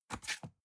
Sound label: cardboard box close fold scrape short